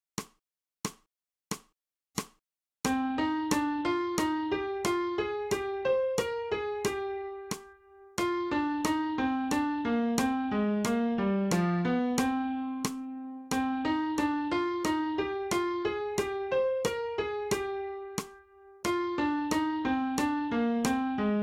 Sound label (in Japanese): 新フレットレス